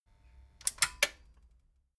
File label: metal button stereo click power off fast